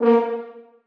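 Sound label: horns staccato a#3; Sonatina Symphonic Orchestra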